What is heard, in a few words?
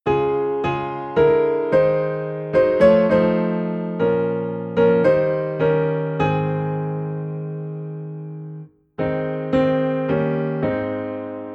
Piano in A Flat